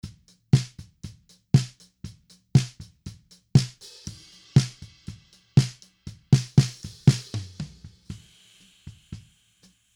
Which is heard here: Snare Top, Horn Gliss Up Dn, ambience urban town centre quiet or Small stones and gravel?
Snare Top